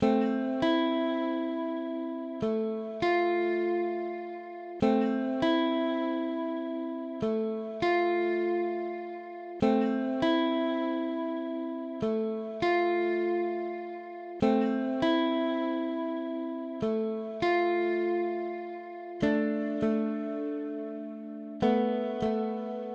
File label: Guitar